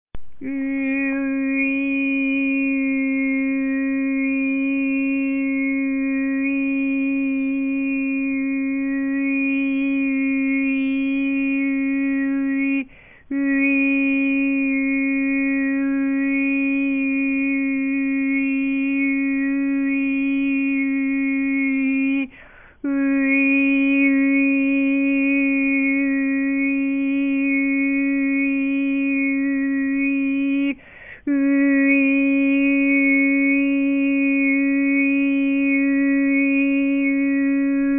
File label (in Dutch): boventoonzang